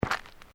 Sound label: Footsteps Sneakers Road Step; Footstep Foley; Human